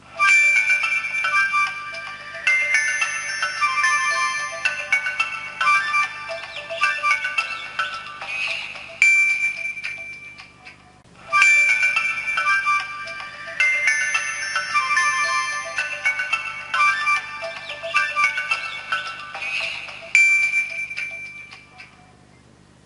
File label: nokia 1200 safari ringtone